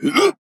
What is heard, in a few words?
Grunt Hit; Androxus